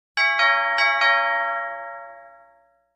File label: Home Doorbell Rings 2x; Classic, High-pitched, At Close Perspective